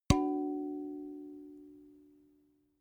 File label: notification kalimba body hit